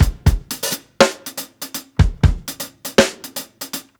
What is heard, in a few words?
120 BROKEN HALF TIME, DRUMS; BASEMENT DRUMS VOL 2